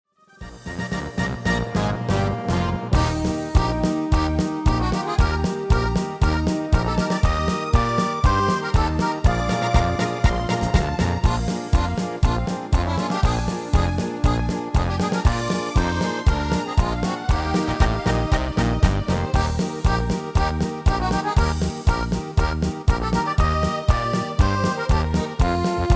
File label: MIDI